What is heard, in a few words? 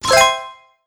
Race Money Pickup; Aqua Moto Racing Utopia; Wii U